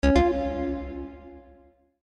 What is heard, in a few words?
Text Tone